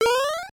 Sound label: Small Jump